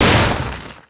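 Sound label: machine wreck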